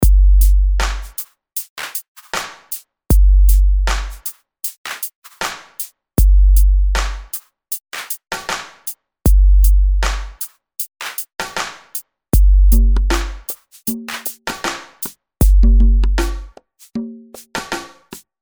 Hip Hop; RP Punch

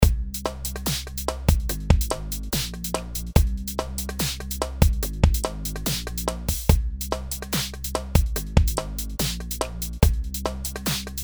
Punch Film TV

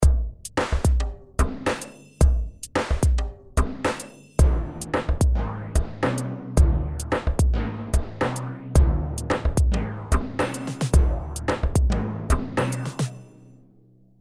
Hip Hop; RP Punch

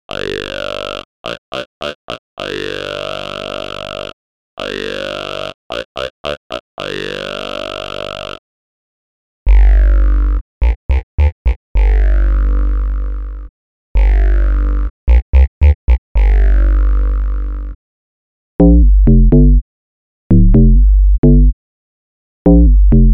Special Basses; Special styles of basses

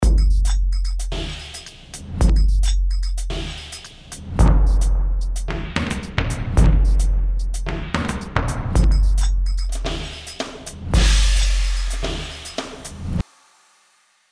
Punch Film Score & TV

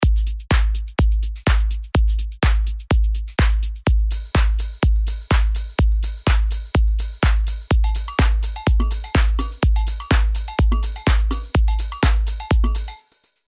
Dance; RP Punch Dance

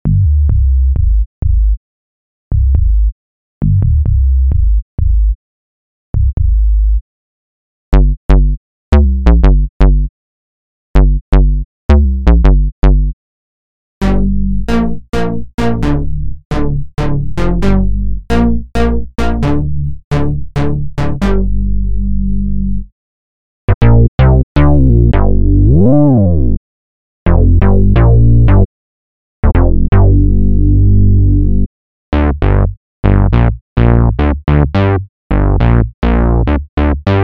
Analog types

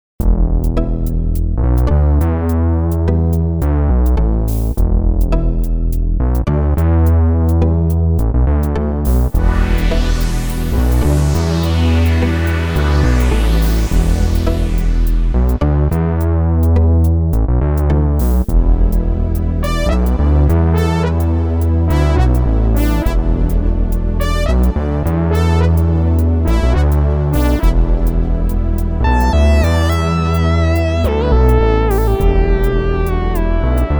Classic Synth; Rob Papen BIT

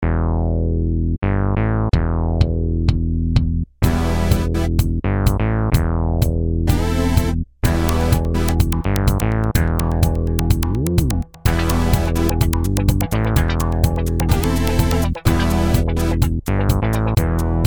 classic synth; Predator 1.6.4

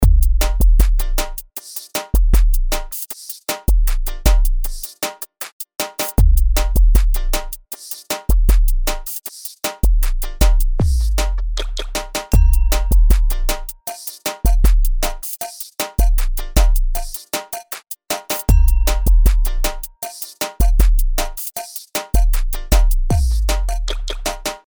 Punch Hip Hop

